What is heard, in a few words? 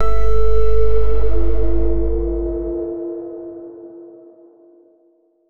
ui sound effect, reveal